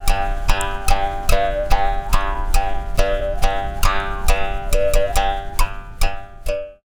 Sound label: mouth harp, ringtones; dustinwells